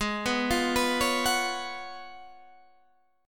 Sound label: F sharp Major 7th Suspended 2nd Suspended 4th, G sharp